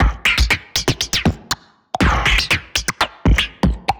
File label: Beat Box Gate Kit, Vocal Percussion; Instruments; Ableton; Factory Packs; Voice Box